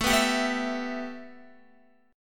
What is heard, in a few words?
A 7th Suspended 2nd Guitar; A7sus2; strummed